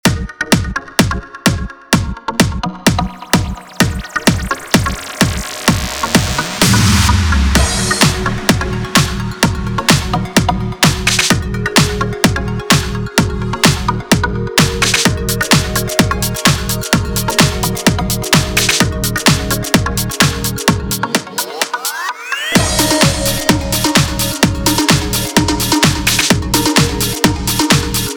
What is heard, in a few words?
EDM